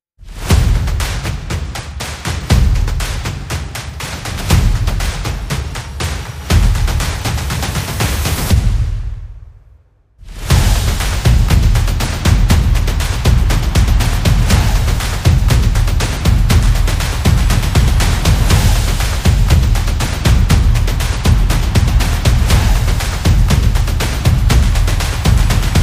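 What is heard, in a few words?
Match Opener, high-energy background music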